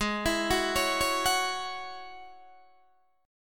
G sharp 7th Suspended 4th Sharp 5th G sharp, Guitar DADGAD